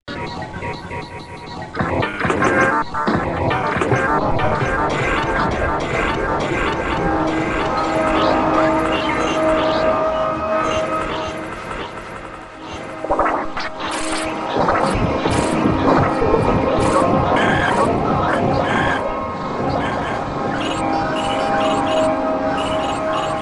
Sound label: technohorror